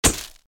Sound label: Bulb Flash; SFX Isaac